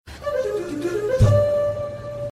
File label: glee transition